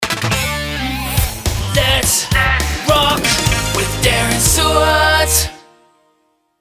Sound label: epic, sung jingle with music